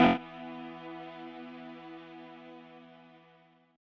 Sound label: chord hit stutter-out, 113BPM; Pony Fan Music Remix Stems